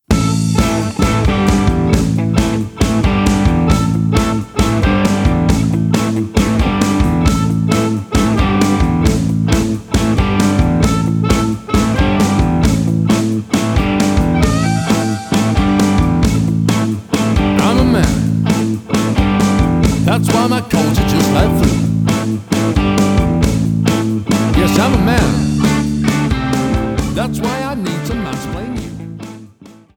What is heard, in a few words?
Blues Band